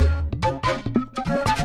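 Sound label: Music Dpad Base 145; from dust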